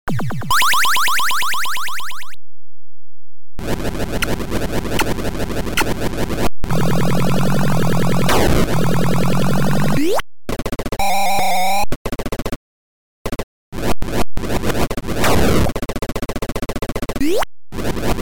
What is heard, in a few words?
sword